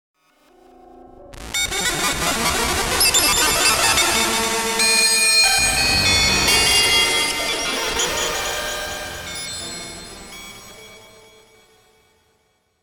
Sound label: Nightmarish Synth Effect